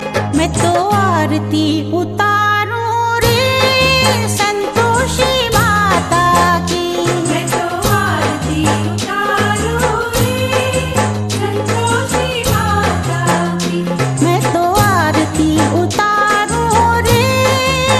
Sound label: mata aarti n dandiya